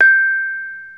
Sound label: BONANG PAN, Bronze Gamelan